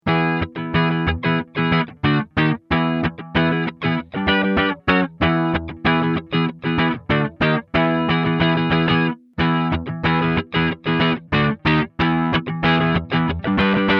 condor, both pickups - direct, Condor, Condor with software reverb; Condor Cab Sim